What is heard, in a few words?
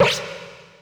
shot